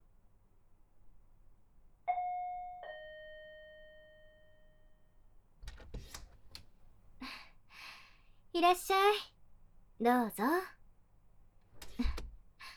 お隣のお姉さんとご褒美えっち, KU100; 勉強を教えてくれるお隣のお姉さんとご褒美えっち

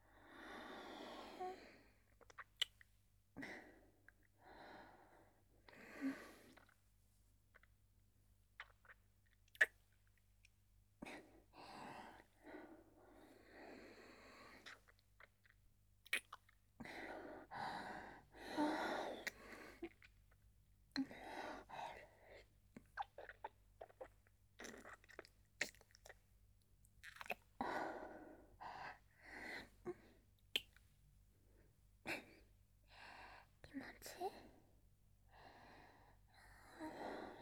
キス; SEあり

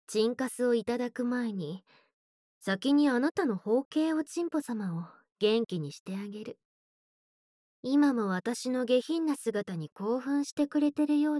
ベロキス耳舐めチンカス囁き♪_耳舐め追加

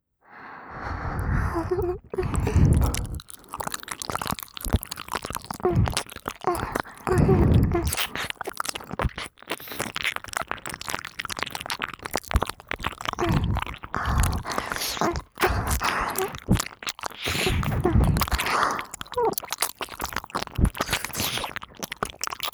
分身3P喘ぎ, ASMR

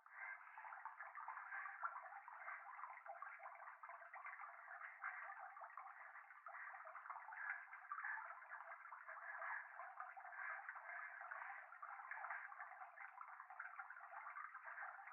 風呂 右耳快感吐息 川のせせらぎ

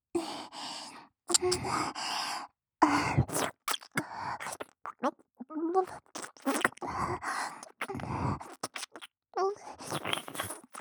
完堕ち 耳舐め; アイリス

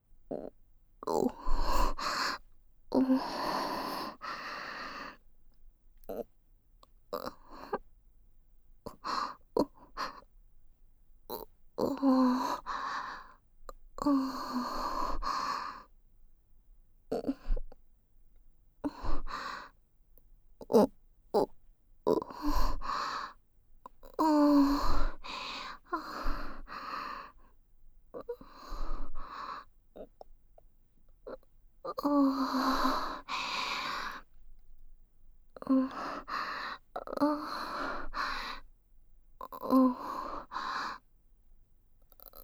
お布団蒸し蒸し汗だくあまオホスローセックスループトラック, ASMR

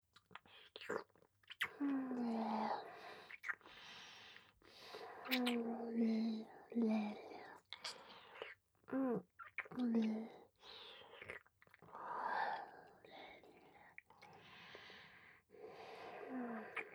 ディープキス_吐息多め

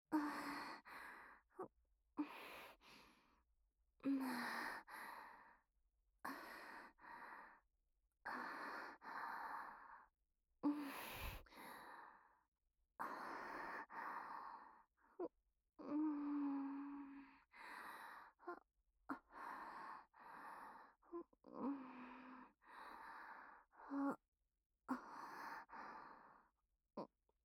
素股、キス、喘ぎ, ASMR; アドリブ集

